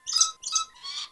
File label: Bird chirp (Red Lories)